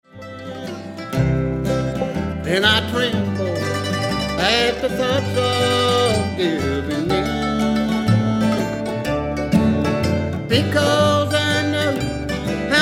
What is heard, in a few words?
bluegrass